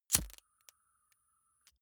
lighter wheel switch on flame gas

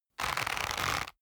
leather creak short medium

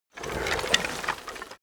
bones rustling movement

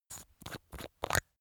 glass bottle open screw

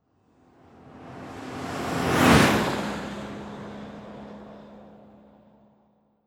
mercedes passing

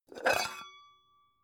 pan open hard

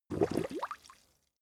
swamp oneshot bubble

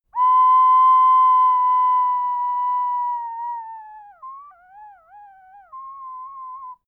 ocarina collapse

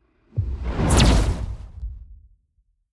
whoosh fire zap flutter

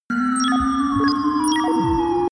facility compute

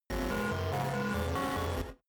space computing machinery

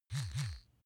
razor smooth double

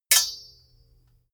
sword hit sweetener

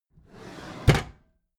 packed drawer smooth clatter open regular

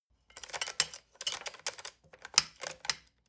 decoding machine handling rattle and clicking

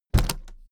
suitcase put down

